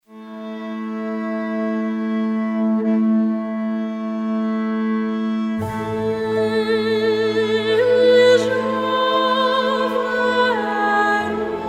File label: Siren Song; Tiragarde Sound; Battle for Azeroth